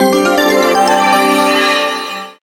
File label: freespin end; Amatic; Book of Fortune